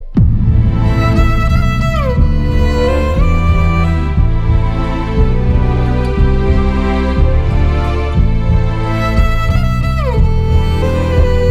BGM Ringtone; Thiruchitrambalam

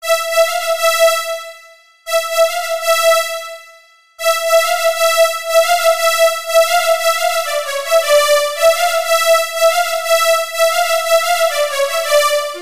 Instrumental Piano Version; VARISU

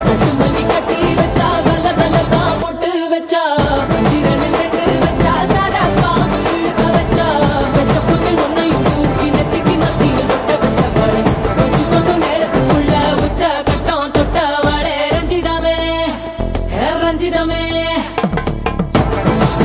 Female

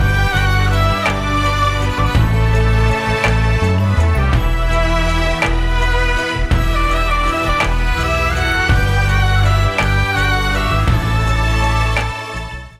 Instrumental

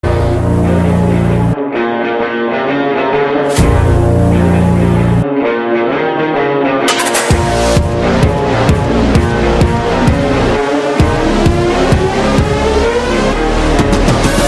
Theme Music

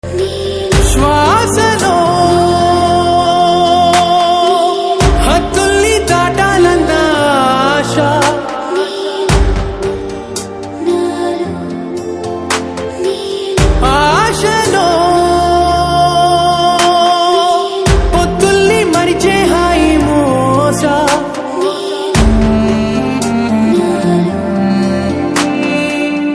Ringtone; Kondapolam

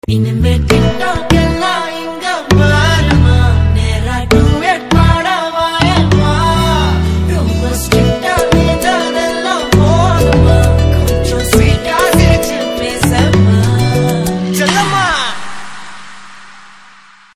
Single Announcement Ringtone; Doctor

